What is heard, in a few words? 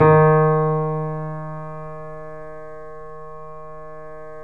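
D#5, Bösendorfer, 3D Pianos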